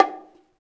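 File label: CONGA HI; East West - Phat & Phunky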